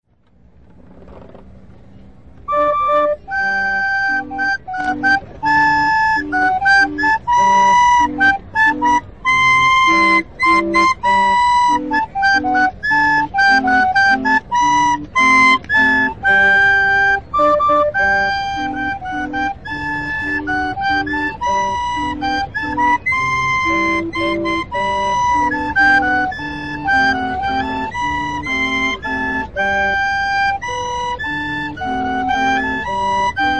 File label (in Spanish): organillero mananitas